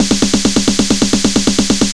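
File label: snare FILL; East West - Phat & Phunky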